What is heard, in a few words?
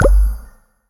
magic pok; minecraft